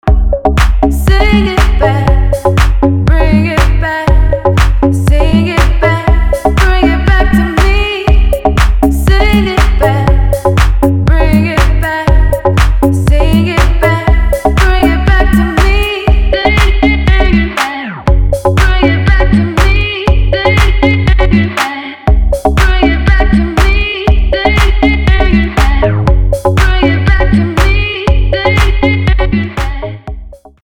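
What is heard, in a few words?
Ringtone, Cover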